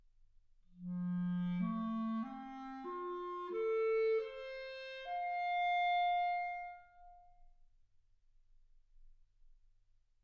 clarinet

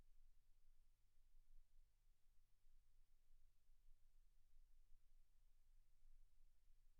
silence 48kHz

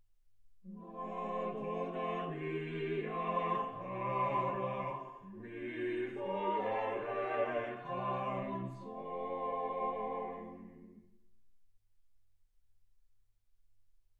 quartet

